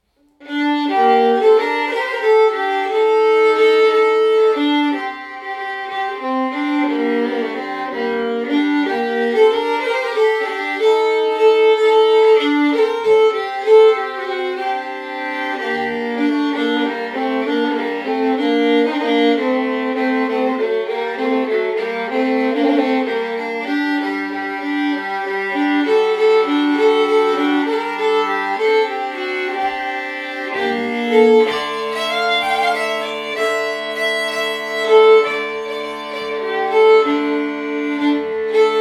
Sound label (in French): deux voix ensemble